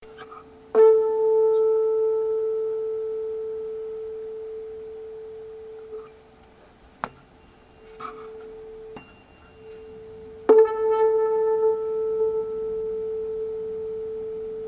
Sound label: tuning fork